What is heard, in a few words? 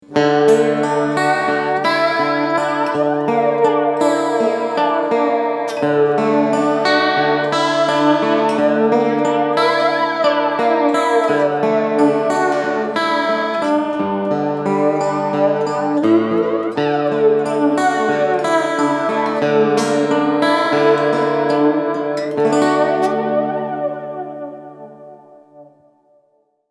ME 3 Mushroom Effekt; LAVA MUSIC